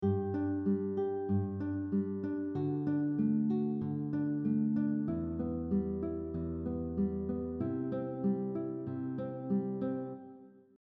FIngerpicking pattern alternating bass pattern - travis picking 2, guitar; Here is another example of using a repeating bass pattern